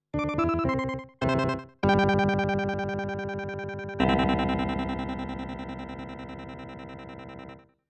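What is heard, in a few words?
K150 alien piano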